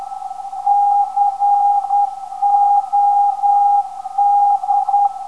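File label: non-directional beacon; COD; 398.5kHz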